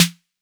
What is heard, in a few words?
808 S d, Roland MV-8008; Roland MV8800